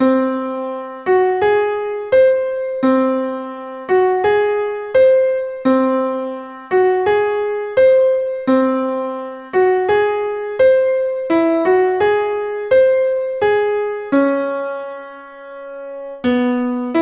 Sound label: Piano